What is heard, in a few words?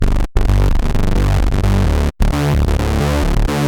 oct, edm bass